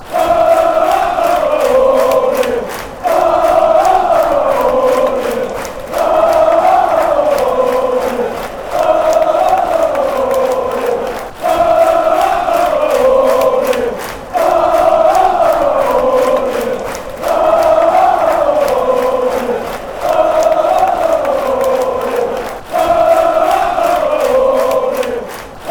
oh oh oh army, chants

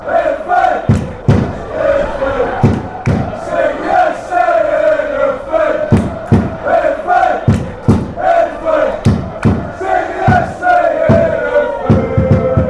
singing, chants; sc heerenveen

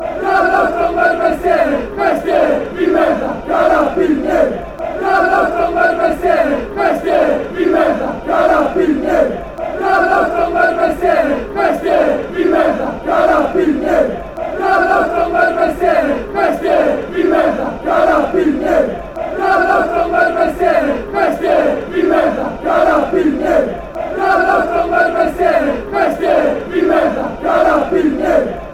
cop, chants

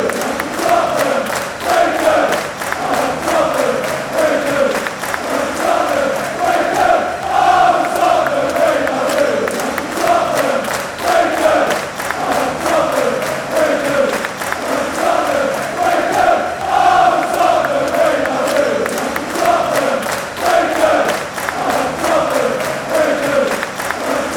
fan chants

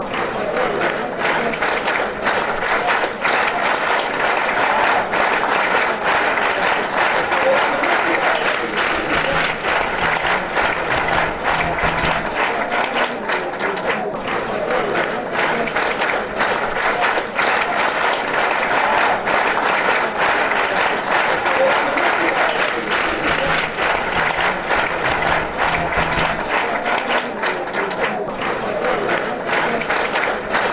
clapping; lazio

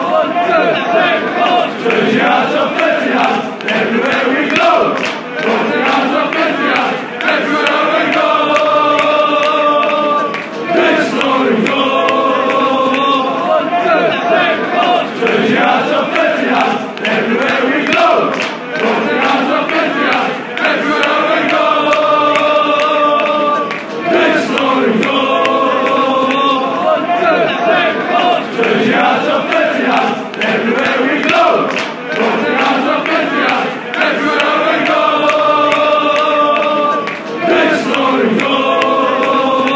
fans, chants; leeds fans at west brom

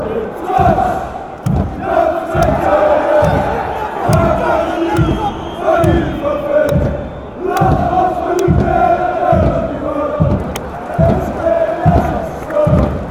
fan claps

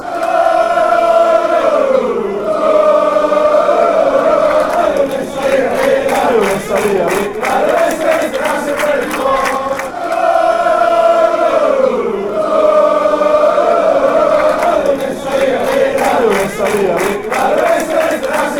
ale, fan chants

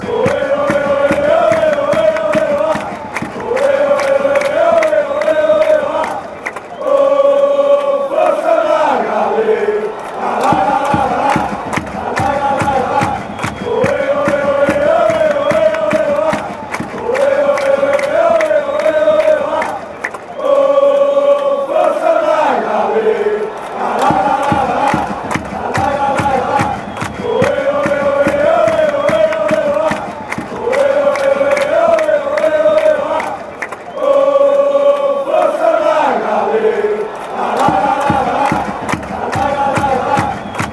oh go allez, chants; braga

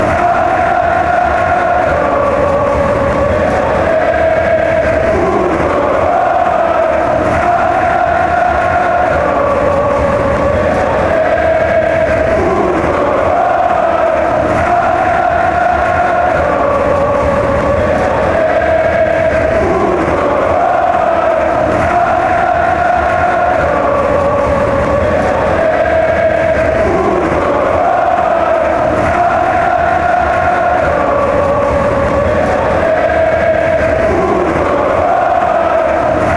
aleo, fan chants; borrusia

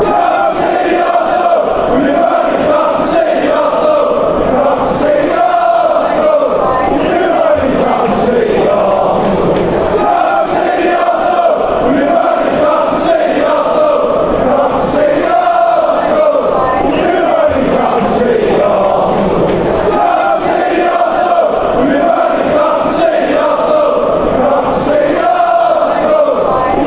come see, chants; arsenal

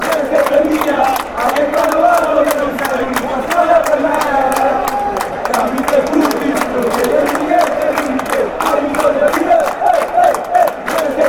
hey hey fan chants

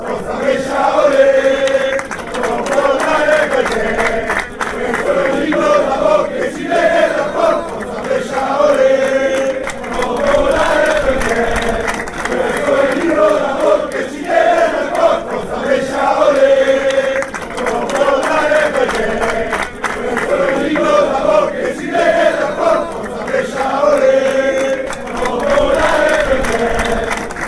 ole, fanchants

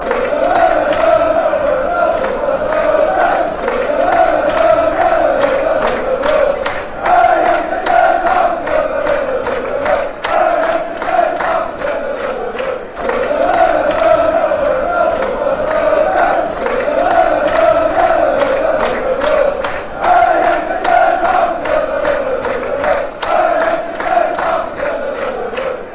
den haag chant

